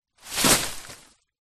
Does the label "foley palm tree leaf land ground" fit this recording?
Yes